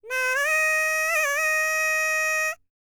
BULGARIAN, World Voices, BALKAN

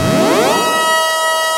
HOE DOWN, SYNTH; Keith Emerson Signature Series

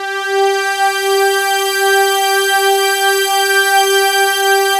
analog swell; Sound Gallery - Charged

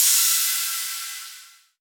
Rides, Cymbals

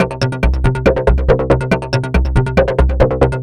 synth 140 runner C; Transmission-X

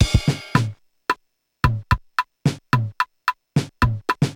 Korg MR-16 (110BPM), beats

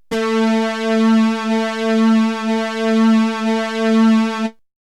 Synth; ZERO G - Datafile DISC 1

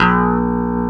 CLEAN GUITAR; Greytsounds; Scott Peers Sound Engineering

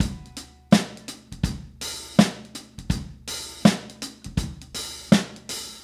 Soul Drums, 70s Analog Funk; Sonic Foundry (Sony Creative Software); What it Is!

